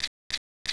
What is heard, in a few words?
silencer